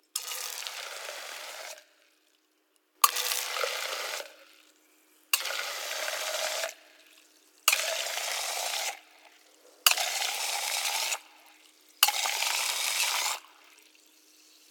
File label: Soda Siphon, Bar Effects; NTG-2; Qbsound Studio